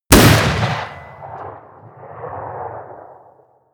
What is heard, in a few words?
pistol muzzleloader crisp stone; schusswaffen